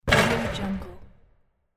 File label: basketball rim shot